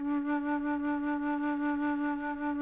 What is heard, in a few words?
CLN FLT E-3, CHAMB FLUTE; Sonic Reality; Vintage Timetraveler